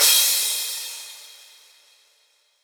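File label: crash cymbal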